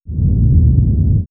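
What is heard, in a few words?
Sub Hum, electronic fx; X-Static Goldmine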